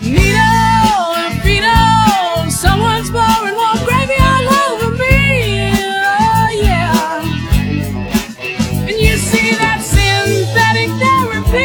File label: voice drums; sound source separation